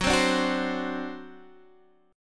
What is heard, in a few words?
C Suspended 2nd Flat 5th Gb